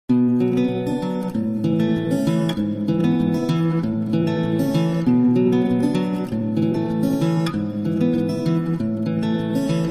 Guitar Ringtone; Miles Of Love

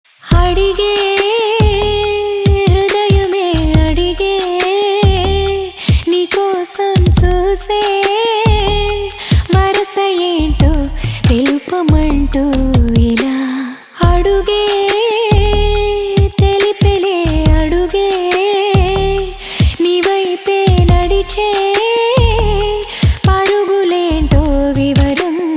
Female Ringtone

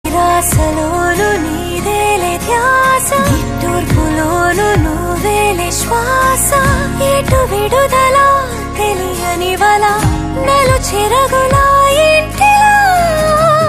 Love Ringtone

